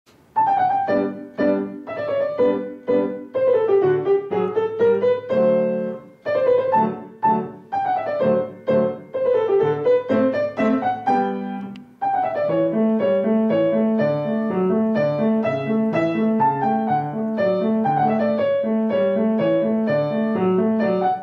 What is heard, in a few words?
military menuet; haydn joseph